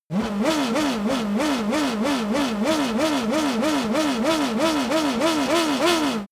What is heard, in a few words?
racing car f1 engine rev sequence